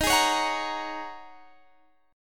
G sharp 7th Suspended 2nd Suspended 4th D sharp

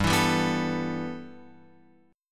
G 6th Add 9th, Guitar, Open F; G6add9 strummed

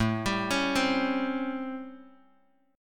A 7th Sharp 9th, Bass Standard; A7#9; arpeggiated; JGuitar

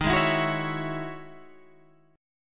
E Major 13th chord

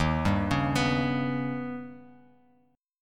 Eb Augmented 7th, Bass Standard; Eb+7 arpeggiated; 11,10,11,16; JGuitar

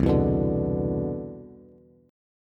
C Major 9th, Bass; strummed

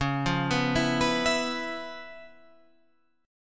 Db 7th Sharp 9th Db down Guitar Open F; Db7#9 arpeggiated; JGuitar

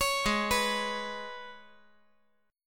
G sharp Minor Double Flat 5th G sharp; arpeggiated; JGuitar

